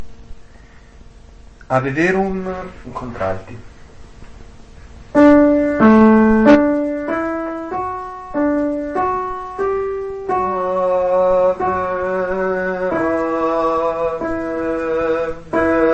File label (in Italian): contralti; Ave verum Mozart